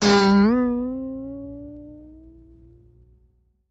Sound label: Bing, metallic, insecty, long, going up; bings